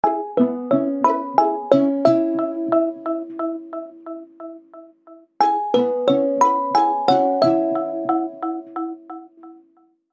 google nexus 6 oxygen

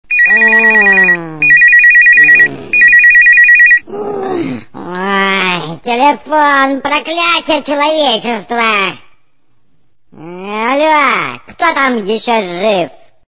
telefon

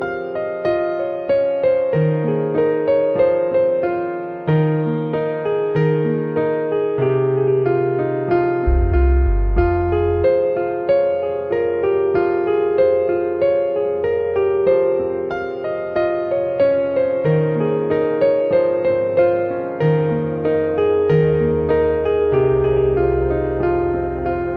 piano

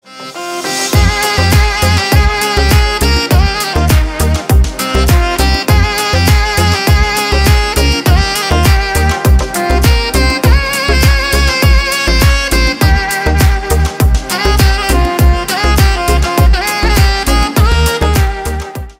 sax remix

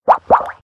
bubbles sms

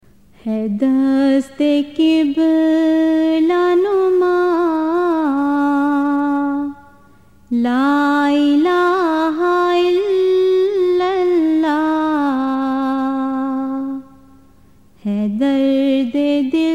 la ilaha ilallah female

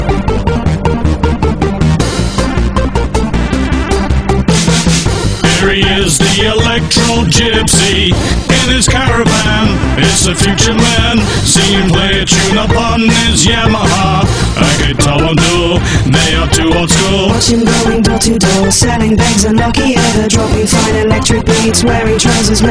electro gypsy

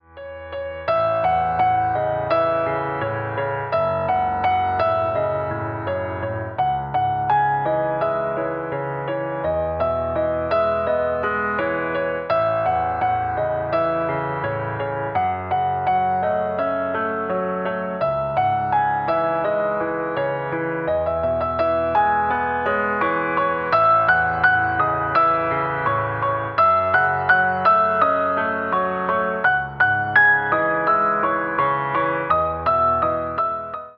piano cover

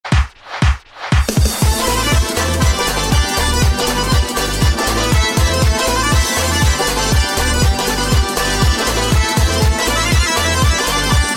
lezginka kavkaz

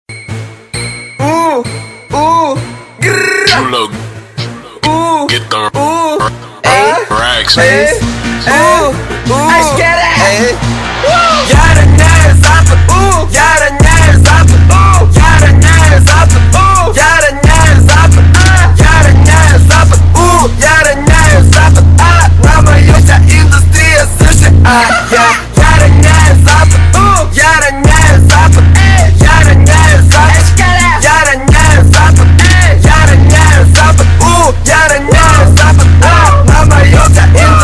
extra bass

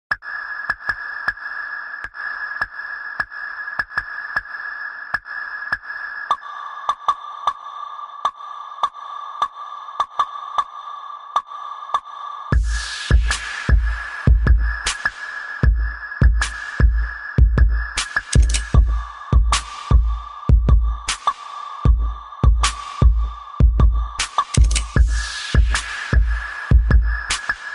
executive bass

